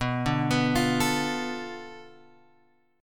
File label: B Minor 7th Flat 5th B Guitar Open G